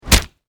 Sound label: Impact Punch Face Meaty Flesh